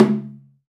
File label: Shu Gu, Percussion; Roland BK-5